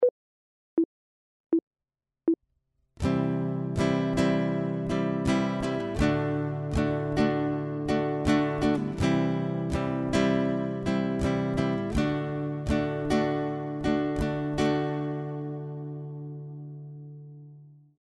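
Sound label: chord, guitar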